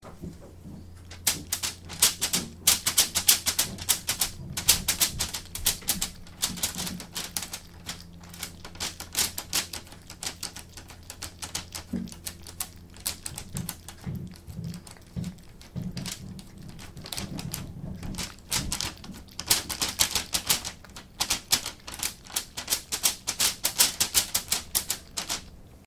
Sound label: cat licking foil